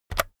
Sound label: keyboard external key press very fast